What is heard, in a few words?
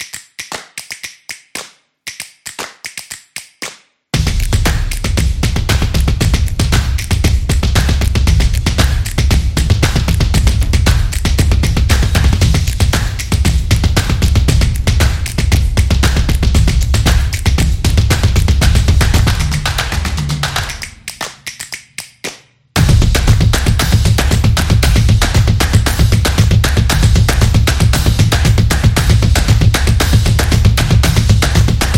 Stomp; Percussive Nation Vol 10